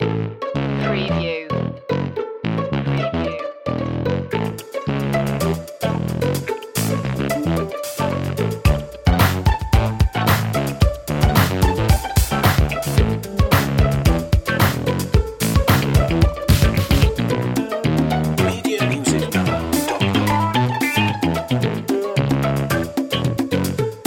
cute n fun loop

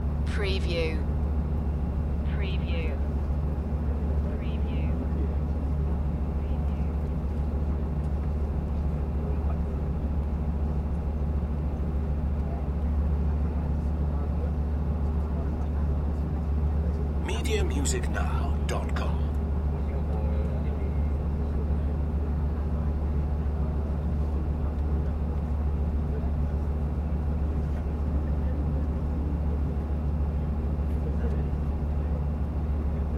idle travel bus loop

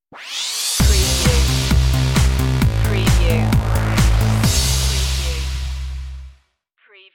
dreamwave stinger

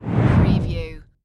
Magical Whoosh Low

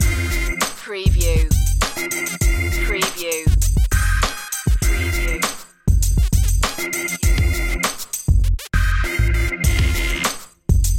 cool cat loop

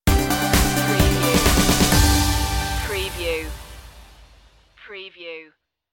new life stinger

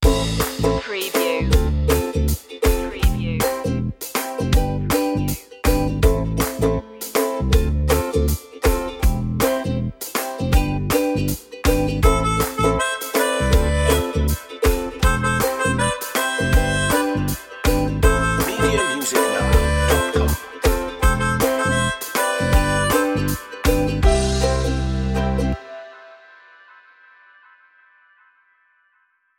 ukulele

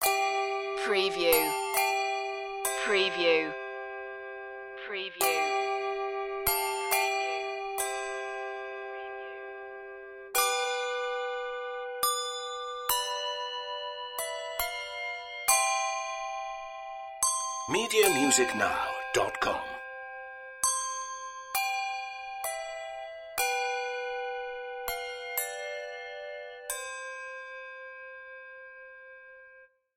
silent night handbells

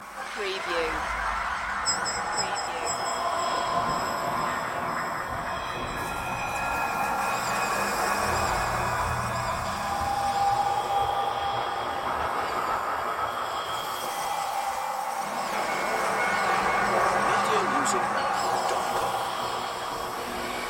abstract static dimension loop

